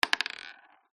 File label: marble down bounce wood floor; zapsplat